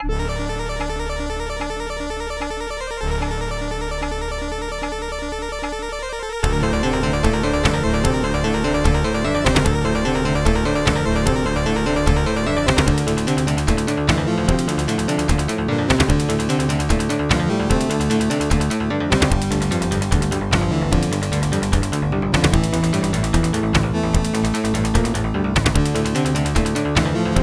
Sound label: arcade boss level 2; alien syndrome